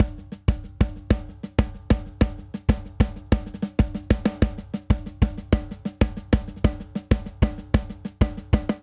Simple Seven Breakdown 7/8 190 bpm, drum; Ableton; Factory Packs; Drum Booth

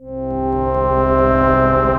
Detuner Pad, Synth Pad; The Forge by Hecq; Ableton; Factory Packs

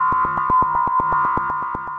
Ambient & Evolving; Ableton; Glitch and Wash

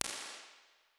Recflects, Medium Rooms, IR Samples; Convolution; NI Kontakt 5

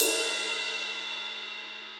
Ride Warm And Low; Drum Hits; Ableton; Factory Packs; Session Drums Club; MPE Hits

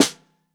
Bottom, Snare

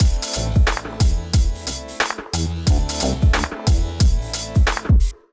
Cooked Kit groove 90 bpm; MIDI Clips; Ableton; Factory Packs; Beat Tools

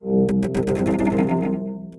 strings; Tentativo

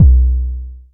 analog tonal, Kickbank

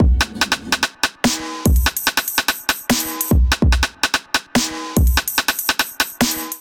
Rage Beat 145 bpm; MIDI Clips; Ableton; Factory Packs; Skitter and Step

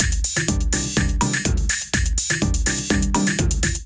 Krillion Kit 124 bpm; Ableton; Punch and Tilt

